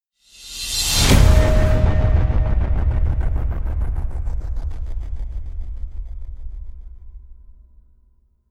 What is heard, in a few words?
evil slams with whoosh; contagion